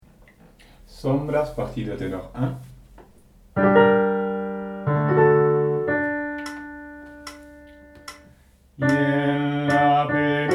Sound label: Tenor